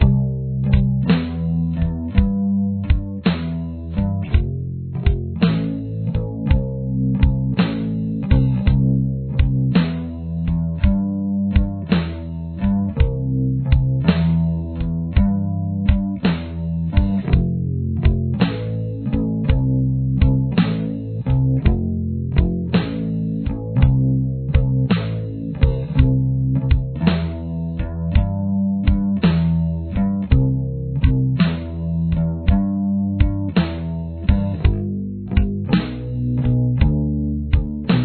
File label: bass drums